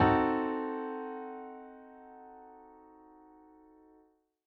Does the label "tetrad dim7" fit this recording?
Yes